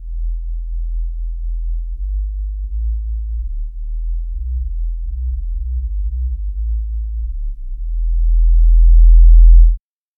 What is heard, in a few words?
whistle bass